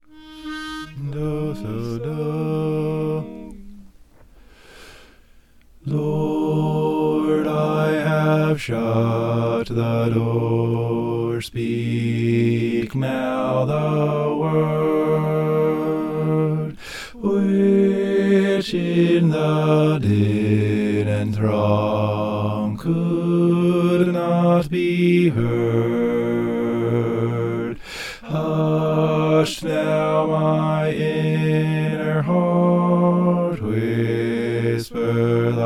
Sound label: sanctuary lord i have shut bass